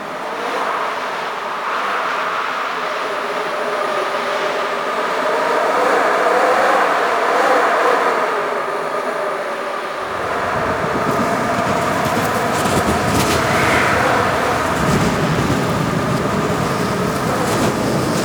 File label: Cold Wind Amb; Warframe; Ambience; Corpus; Crp Exterior